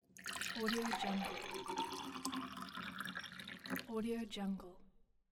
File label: pour water; greenwaysound